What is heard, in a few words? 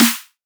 punchy snare